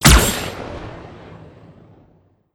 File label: plasma rifle fire; weapons